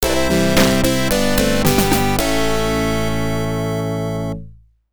Jingle (OPN)

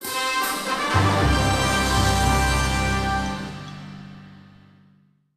Intro Fanfare

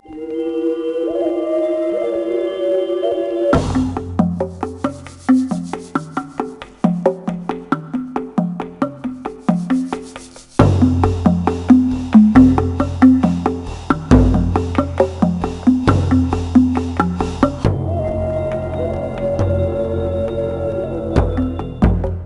Title Music; Know How Think and Play Outside the Box; NDS

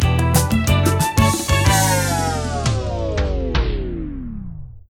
Trash Chute Music (Empty)

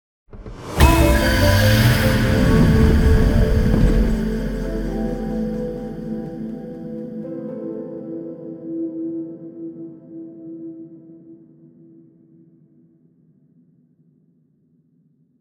Crow Shrine Glow; Death's Door

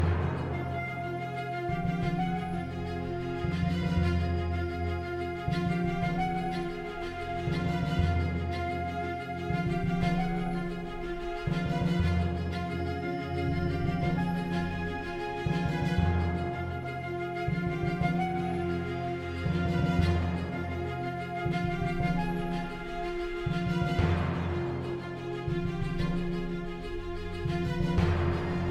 Enemy Fight Stem; Icy Plains Enemy Fight 2 Stem 1

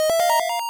Power-up; Splatterhouse 3; Genesis

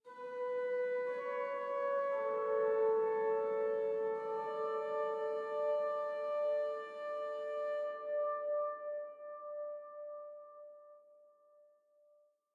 Organ High

